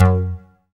K-2000, Synth Bass

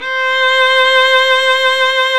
STR VL C 6, STRINGS; Roland - LA Composer vol. 1

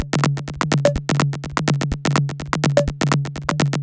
Changeling 125bpm, House Drumloops; Zero-G House Fabrik; 90 s Sample CDs

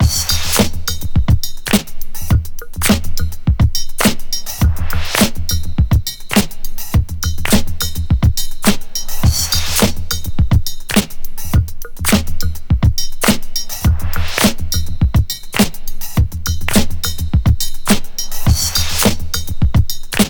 104 Funk Machine, Breaks; Lockdown Breaks

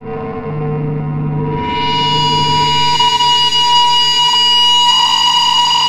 Feedback, Industrial; Nasty

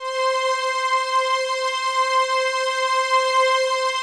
PAD, DIGI-PADS

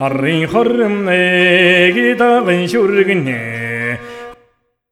MONGOL V, Asian Traditions; USB Soundscan vol.60; AKAI

